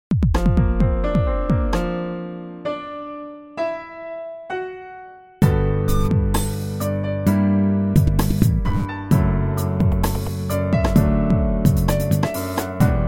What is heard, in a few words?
bass